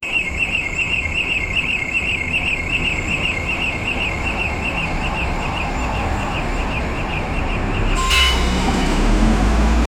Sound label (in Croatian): urbani zvukovi sirene aut; traffic light